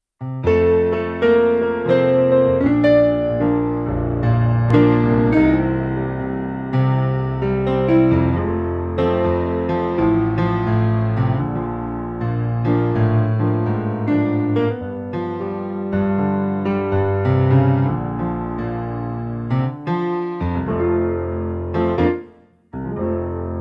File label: pc3 rock